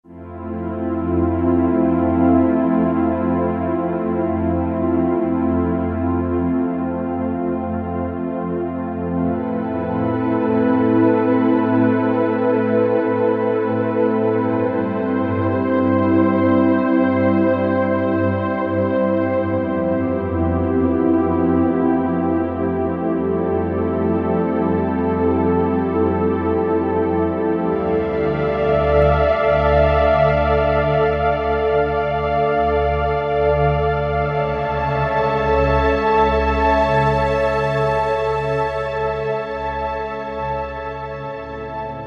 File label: String Cloud; Roland SRX-03 Studio SRX